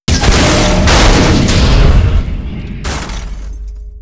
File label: plane door blast; Left 4 Dead 2